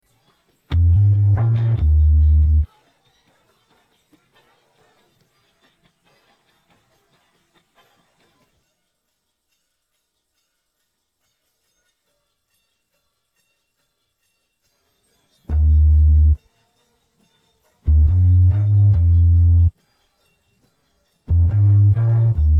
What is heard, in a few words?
bass